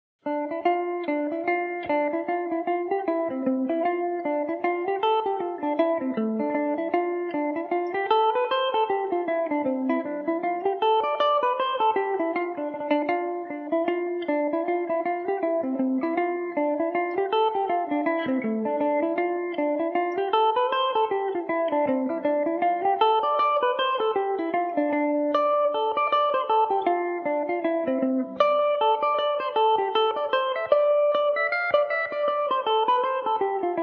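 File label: D dorian mandobird, Irish mandolin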